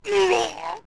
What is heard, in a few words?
Ganondorf Hit Arrow